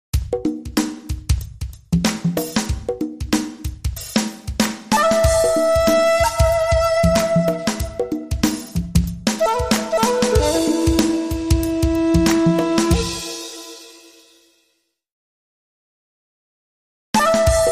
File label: Jazz Tech